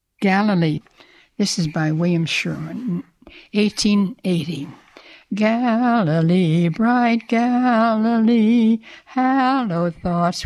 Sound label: hymn